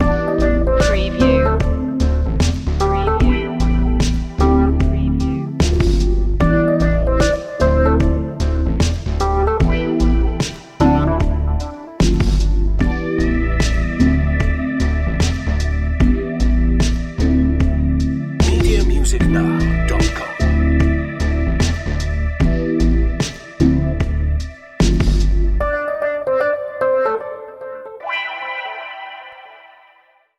jazzy trap

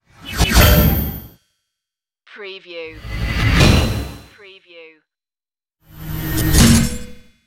starship door closing

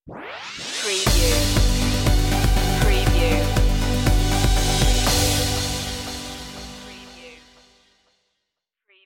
uplifting light stinger

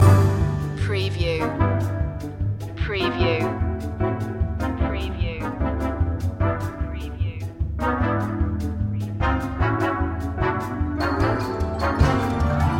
nostalgia tv loop

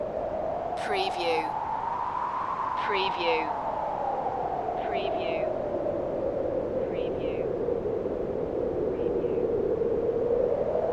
wind cold loop long

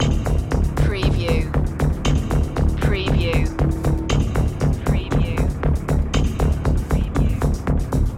the showdown trailer loop

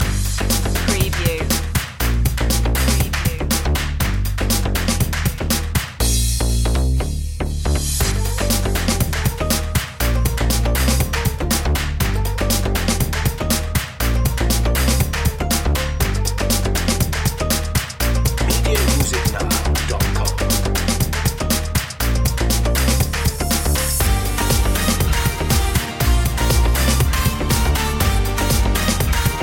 latin groove loop long; media music now

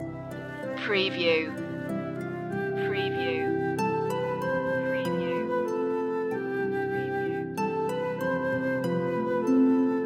orchestral loop; the first noel; media music now

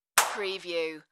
Clap

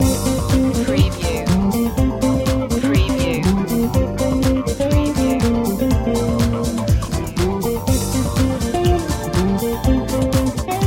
snapshot style loop

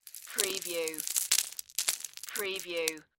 Debris Rock Pebbles

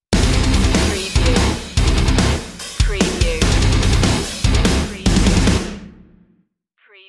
head stomp stinger

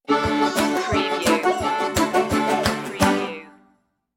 scraping the roux stinger

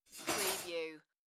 Metal Cutlery Drop; beanstalk audio

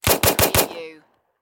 Submachine Gun Thompson

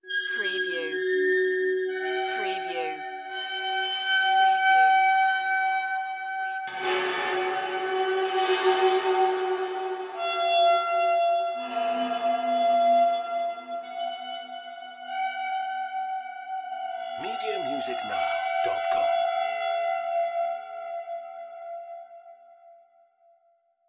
Metal Scrape

